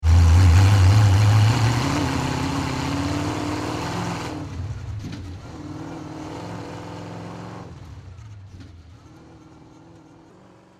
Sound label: Chevy V8 Hot Rod Drive Away, Hot Rod driving away medium fast; Car Sound Effects; LYN096 package of Hot Rod sounds